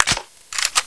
stg44 clip in

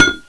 m79 bounce

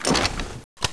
svdex foley, weapons

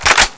l85 foley

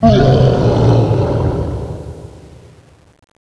zombie death stamper; nst zombie

